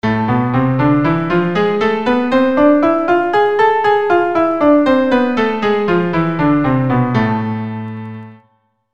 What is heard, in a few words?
A Harmonic minor, keyboard